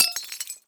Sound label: glass bottle break, glass bottle drops and breaks